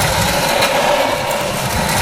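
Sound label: metal scrape small loop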